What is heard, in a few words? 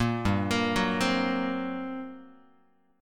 G Minor Major 7th Double Flat 5th, G, Guitar